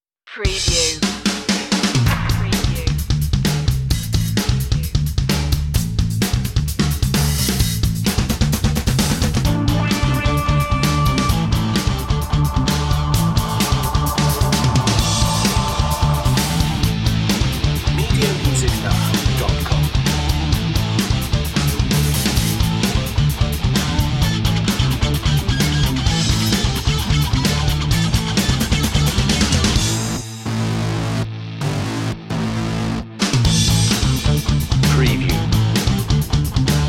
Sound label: slow burn rock